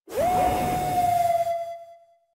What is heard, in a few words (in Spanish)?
Grito; Zacian